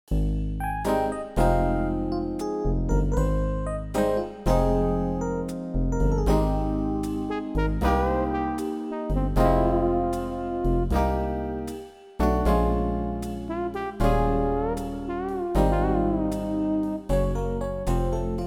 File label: yamaha v50; factory demo